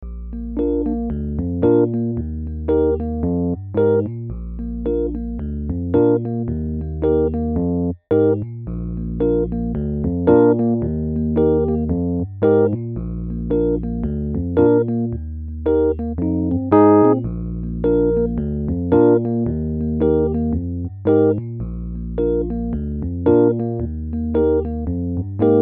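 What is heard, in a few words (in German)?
Reggae Begleitung ternär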